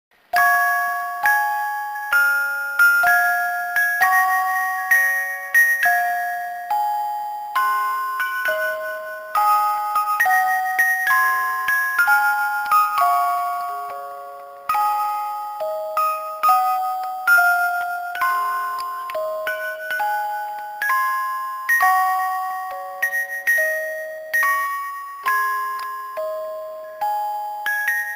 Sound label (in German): orgel